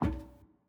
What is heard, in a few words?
Footstep Metal Stairs